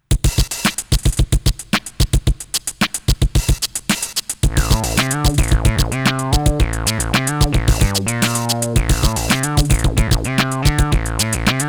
clunky funk

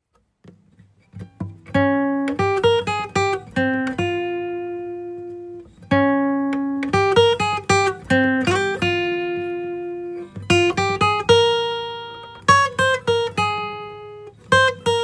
etude in f minor

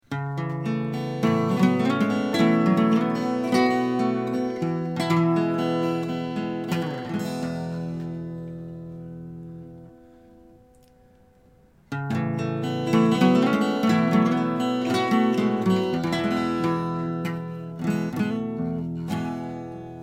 acoustic guitar

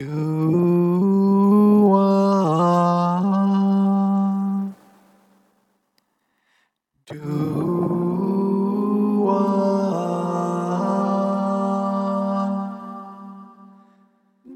doo wah

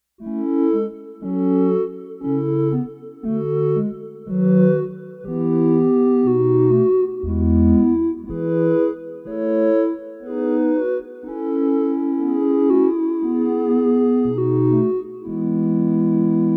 bach chorale

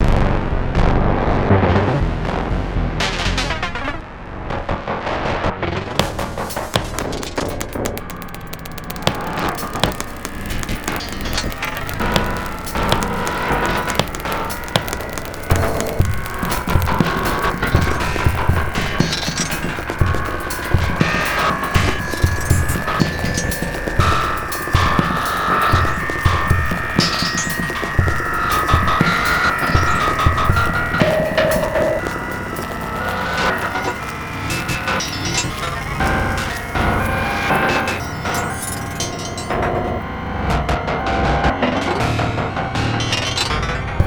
tense 1 note drone

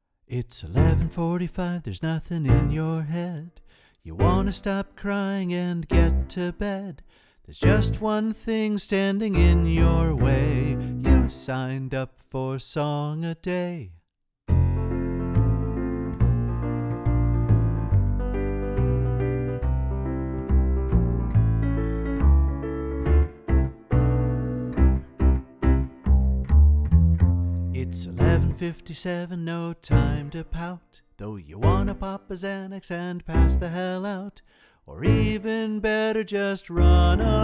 a sad jingle

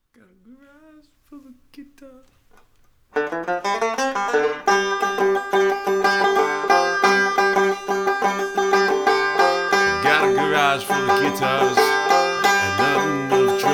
banjo; greacen

